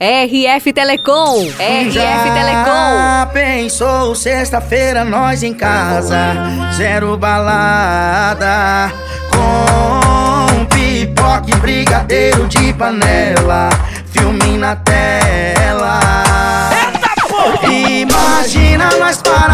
sertanejo; rftelecom

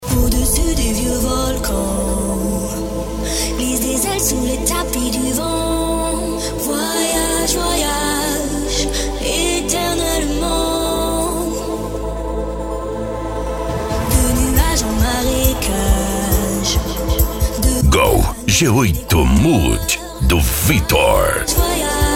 funk ritmado

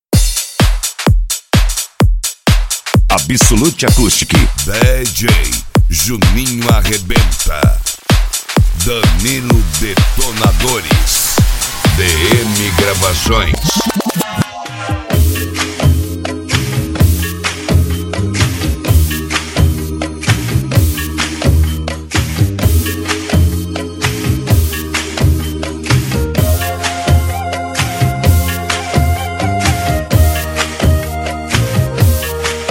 deep house eletro funk

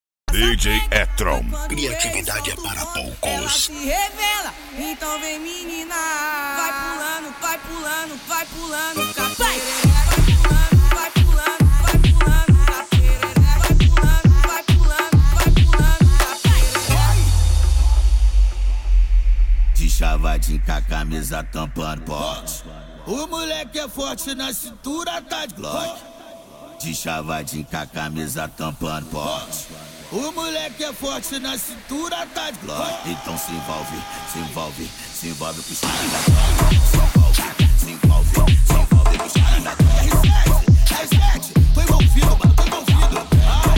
mega funk funk

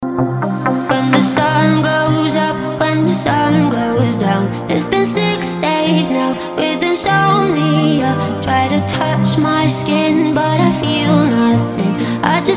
deep house e pisadinha; le point som e acessorios; funk

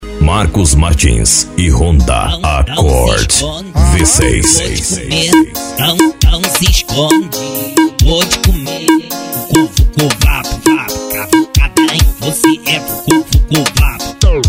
piseiro

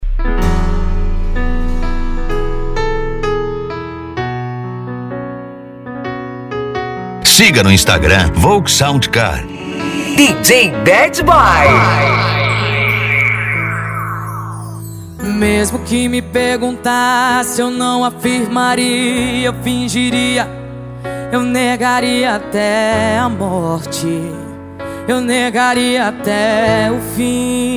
sertanejo e pisadinha; cd volks sound car

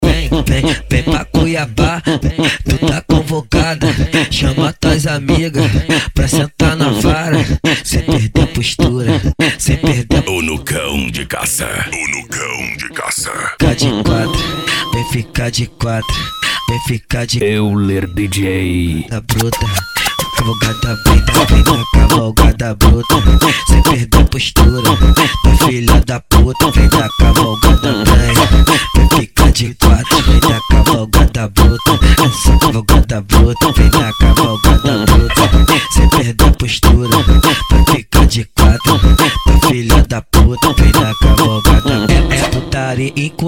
funk ritmado

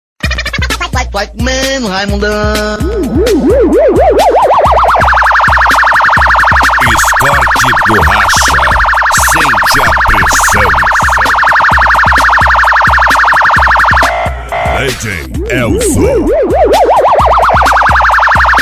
sirene; cd escort do racha 2023

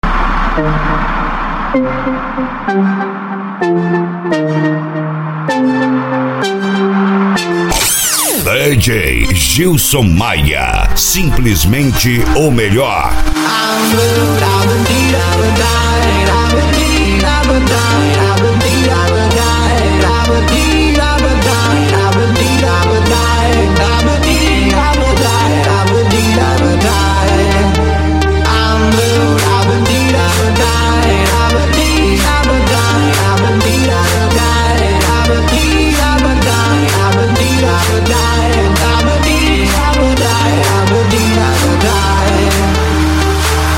trap funk grave; cd trap funk solta o grave

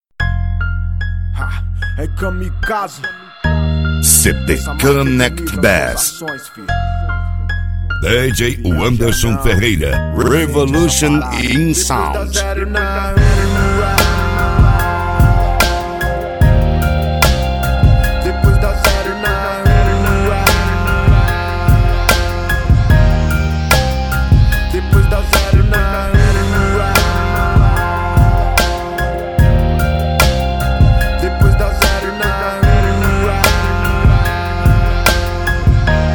bass house e rap

